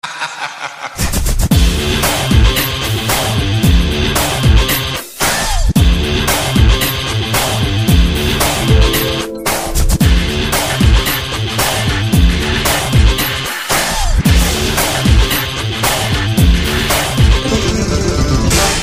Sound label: Guitar Play